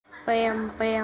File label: bam bam